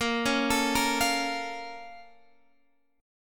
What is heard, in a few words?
Bb Minor Major 7th; BbmM7 arpeggiated